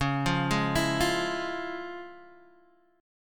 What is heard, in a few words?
F Minor Major 7th Sharp 5th, C sharp